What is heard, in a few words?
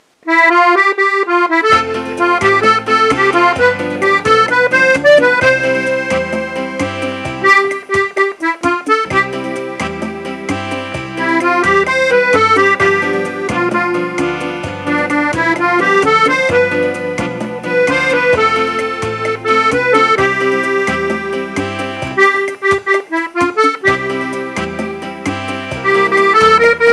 Bachata